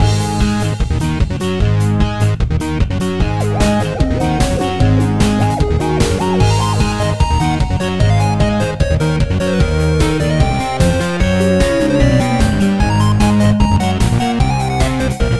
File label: Theme Loop; Circuit Dude